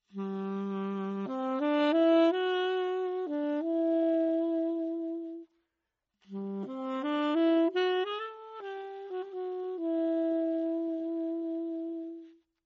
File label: saxophone